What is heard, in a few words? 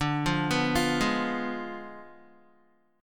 D Diminished 7th D down Guitar; Ddim7 arpeggiated